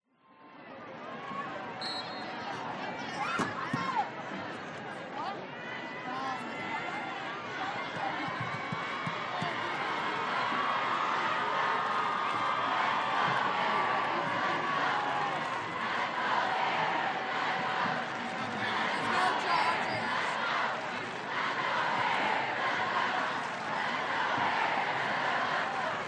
american football crowd chant opp side staduim then close shouts cheers